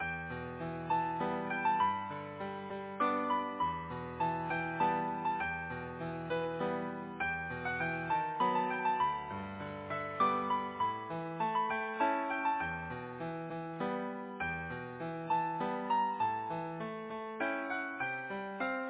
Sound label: Easy Piano